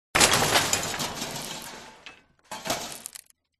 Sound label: crashes junk various; Audio Mangler